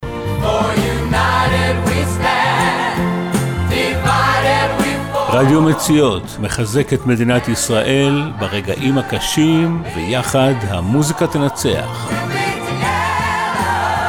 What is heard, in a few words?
Jingle